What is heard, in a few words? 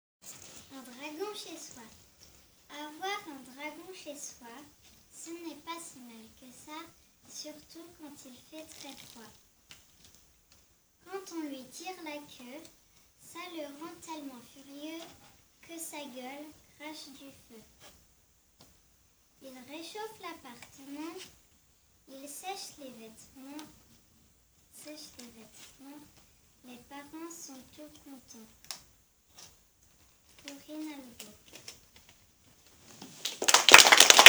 vocal